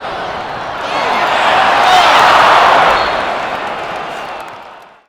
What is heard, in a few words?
crowds